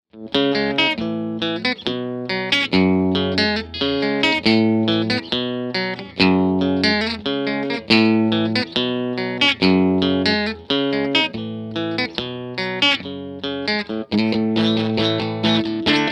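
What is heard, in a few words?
TC15 Channel 2 Dirty Crunch Hi hi INPUT Dano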